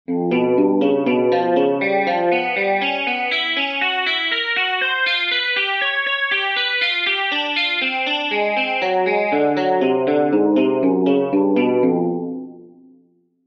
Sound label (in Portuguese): Exercícios Guitarra 120 bpm